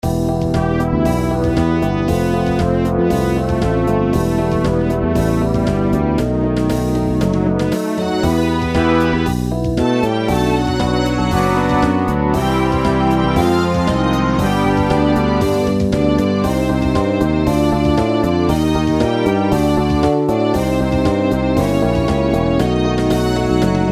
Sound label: pop